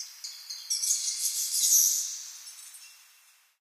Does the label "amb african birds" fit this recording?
Yes